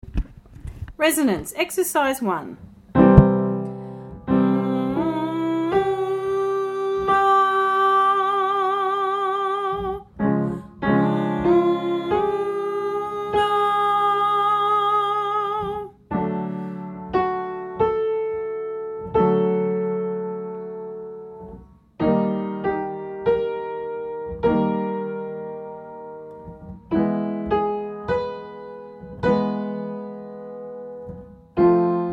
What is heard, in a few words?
resonance; singing exercises